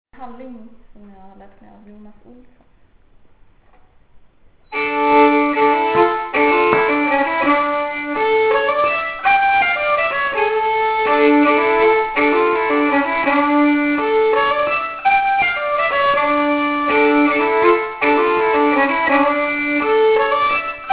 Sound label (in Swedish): halling; Jonas Olsson